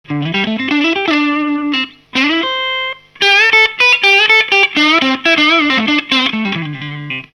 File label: Blues Solo, Guitar Effects Pedals; Pro Co